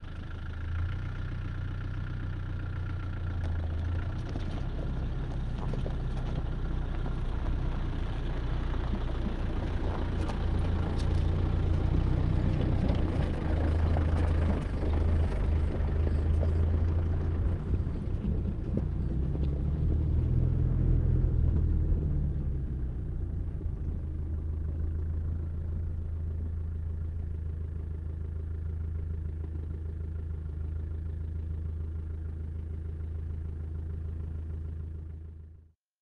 driving downhill first gear distance 10m 5m driving behind hill pan lr; fvtt sonniss gdc audio